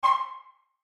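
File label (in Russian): короткий громкий звон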